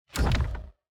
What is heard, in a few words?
environment successful build low